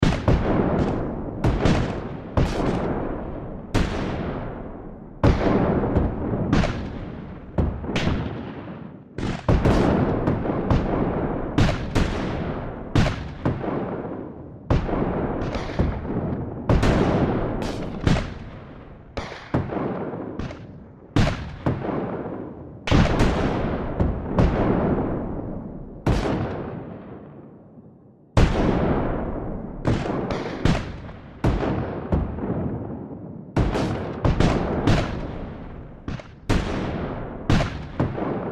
Battle Civil War Revolutionary Cannons Gun Fire Historical Loop Battlefield; Warfare; Blastwave